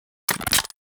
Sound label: Briefcase Launcher Rearm, Weapons; Warframe; Corpus